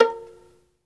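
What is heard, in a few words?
SOLO VIOLIN, Synclavier Strings; ILIO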